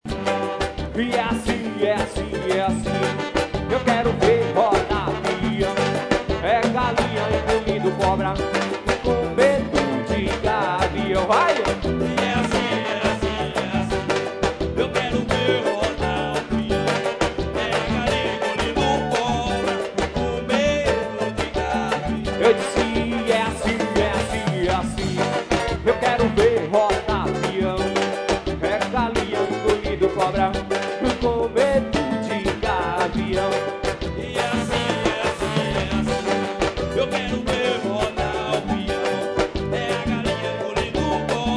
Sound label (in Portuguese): Pout pourri samba